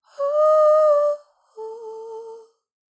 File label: falsetto thing, vocal